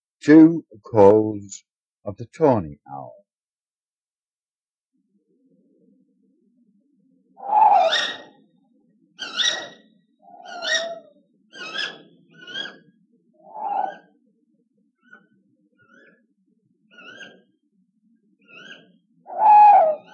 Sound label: Tawny Owl calls